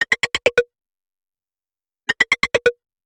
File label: squirrels, ringtones; Nothing Phone (2)